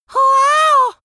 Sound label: amazed boy